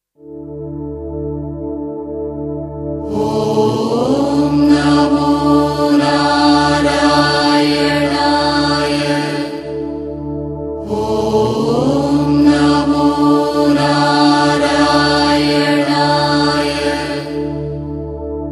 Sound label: Chanting, devotional; perumal; Thulasi